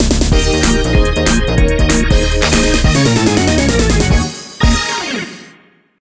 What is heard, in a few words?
Jingle ~ Mini-Game Start; mario super sluggers; wii; Toy Field